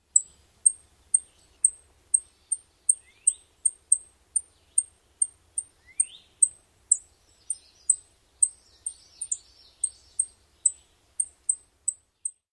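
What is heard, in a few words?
Magnolia Warbler, alarm chips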